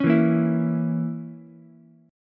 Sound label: Bb Diminished Bb down Ukulele; strummed; chord shape